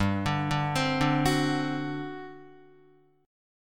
G Major 7th Suspended 4th, G, down, Guitar; GM7sus4 arpeggiated; JGuitar

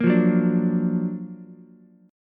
F sharp Augmented Major 7th F sharp Ukulele; strummed; JGuitar

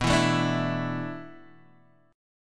E 7th Suspended 4th

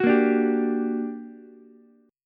G Minor Major 7th G, Ukulele; strummed; 11,10,10,10

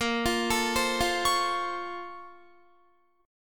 Bb Minor Major 9th, Guitar; arpeggiated; 4F,4A,5C,5G,5C,6E; 17,20,21,17,17,21

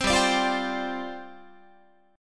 G 7th Suspended 4th G down Guitar

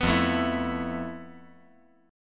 F Major Sharp 11th, chord; down; 5G,4C,5E,5A; chord shape

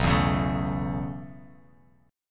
B 9th Suspended 4th B down Bass Standard; B9sus4; strummed; JGuitar

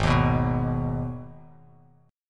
G sharp Minor G sharp down Bass Standard, chord; G#m strummed; JGuitar

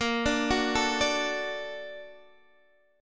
Bb Augmented Major 7th Bb, Guitar Half Step Down

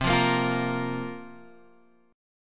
C 7th Suspended 4th, Guitar, Half Step Down; C7sus4

